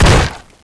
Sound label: kick wood; cstrike; ZombieDarkness